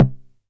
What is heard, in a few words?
909 SD Tape; Goldbaby Tape808 & Tape909; The Tape 909